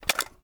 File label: ar reload mag out; weapons; gmod; viper; scharlie